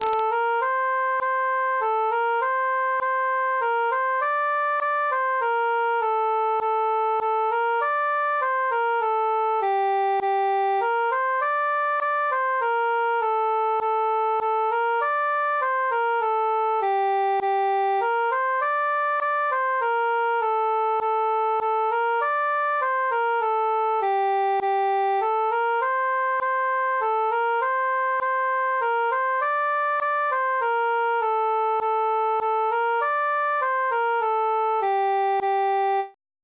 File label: cantus solo